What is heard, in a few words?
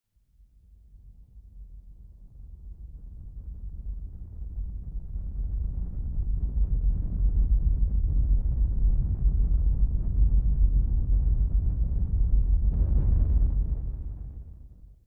Giant Floor Panel Arrive LFE; Boomtown; Sideways Town; Titanfall 2